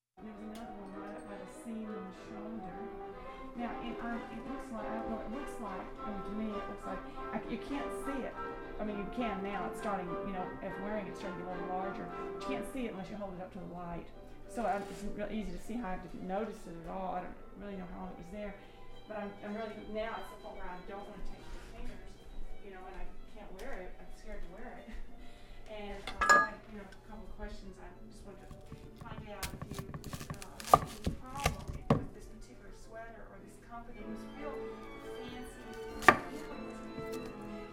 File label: Late Afternoon at Home